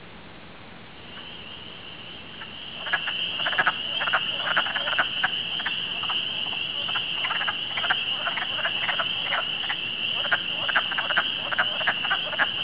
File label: wood frog